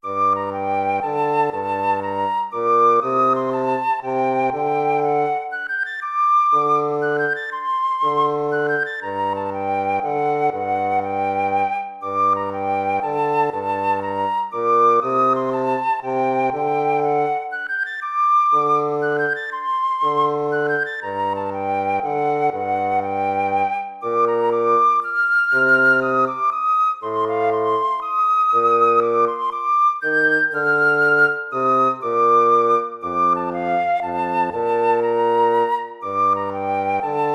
baroque minuet minor

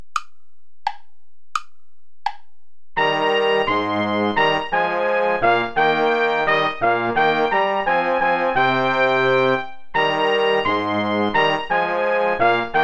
play along without trumpet; schutz heinrich; ich will solang ich lebe

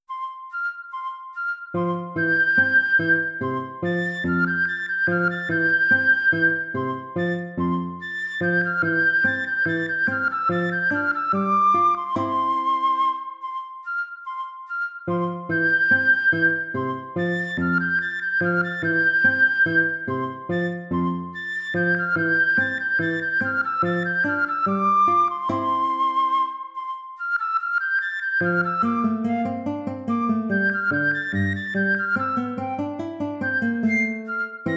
gavotte; corelli arcangelo

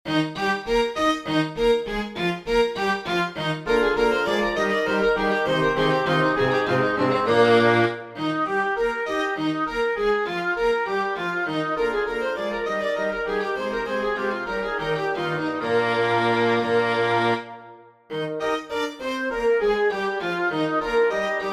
for winds strings; vivaldi antonio; sicut sagittae manu potentis from nisi dominus

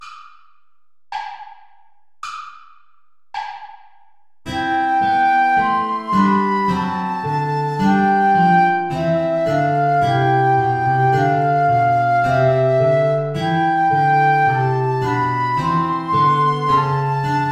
play along without guitar; lobet den herren alle die ihn ehren

